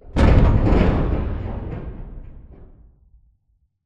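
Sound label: explosion distant metal; corporate; Titanfall 2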